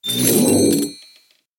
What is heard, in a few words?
money